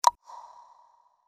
Tongue Concert Hall

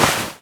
cloak; kult

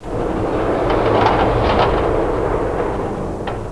closing sound; Transitioner 1.0; DoorTranFat

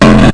hard-mid, bdrum; nonlinear fx

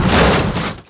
Ground Crash; Superbike 2001

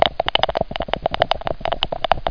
ROLL, dice; CASINO; PKDICE

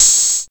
Drums; alone in the dark 4

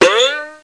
twang; PUZMANIA

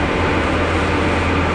elev 1 loop, elevator; SiN Demo; environment

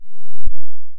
HQ Smooth Inv Saw; flstudio502

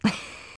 cough; bloopers

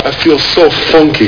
FUNKY; Multimedia Toolkit

